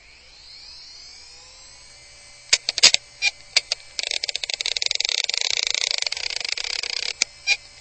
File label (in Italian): testina su disco